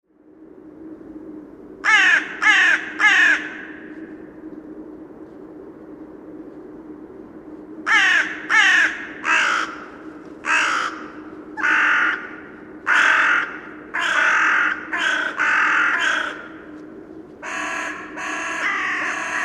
Svartkraka

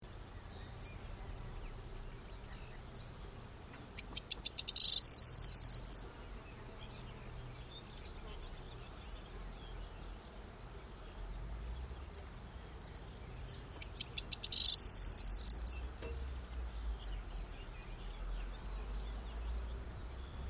Kornsparv

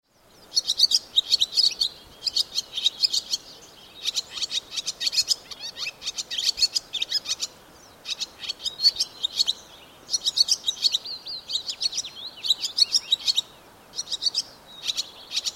Ladusvala

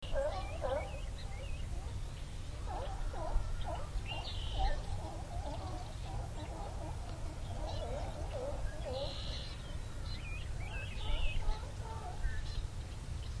Sillgrissla

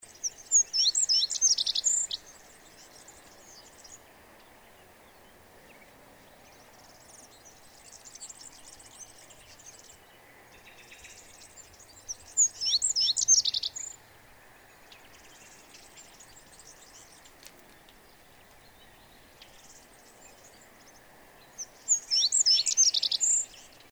Klippsparv

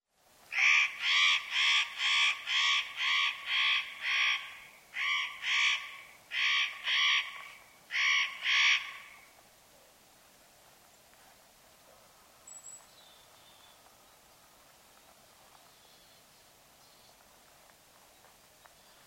Notkraka

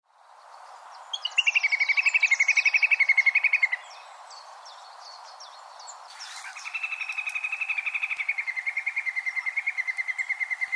Smadopping

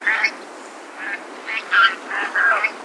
svangas

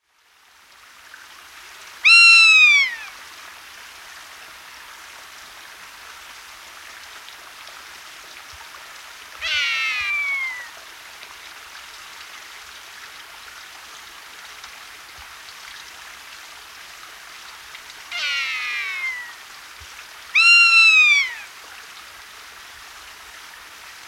fjällvråk

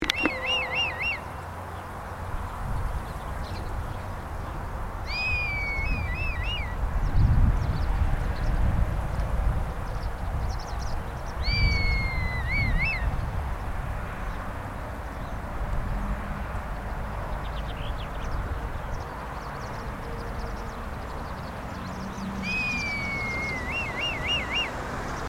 Rod glada

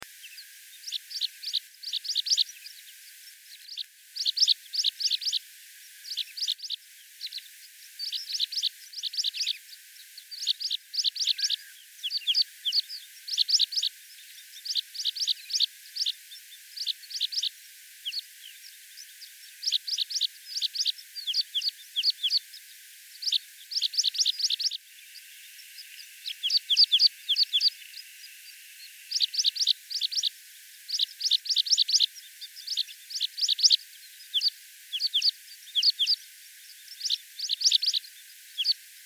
Hedpiplarka

